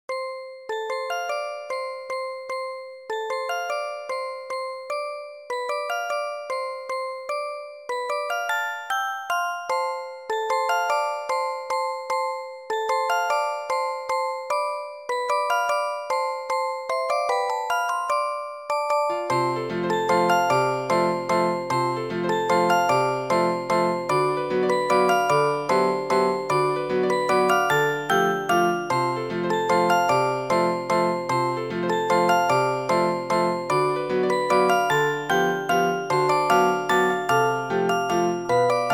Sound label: night waltz